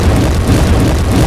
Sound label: Warrior Furious Loop